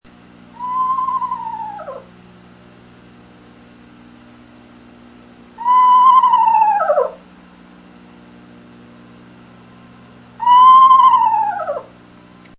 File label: eastern screech owl; the harpy cometh